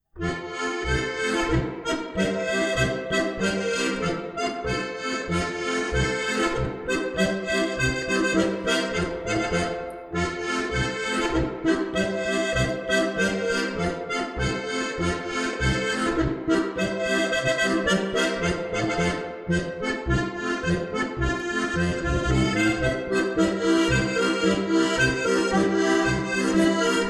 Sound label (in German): Walzer, Alpenlander, fröhlich und relaxt. Solo Steiris; melodion akkordeon